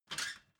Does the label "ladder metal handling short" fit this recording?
Yes